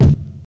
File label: old school bass drum single hit d key, kick single shot tuned to the D note